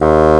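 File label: bassoon D#2; East West - Global Sounds; WOODS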